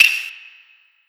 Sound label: normal hit clap